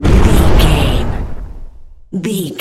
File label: wind blizzard storm spell blast